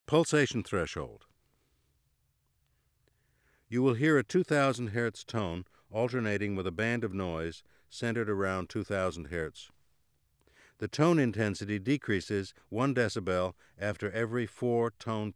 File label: Pulsation threshold, Auditory Demonstrations